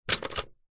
office typewriter key press typebar front close to key vintage 1960; lemair helvetia